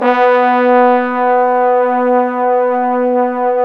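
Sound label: Bone Sec, trombone section; Roland L-CD702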